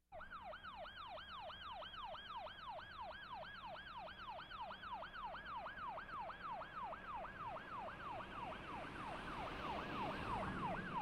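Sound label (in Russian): полиция с сиреной подъезжает и останавливается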